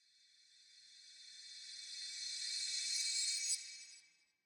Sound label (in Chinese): 诡异森林末影声; ambient